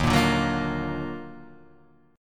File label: D sharp Minor Major 9th D sharp down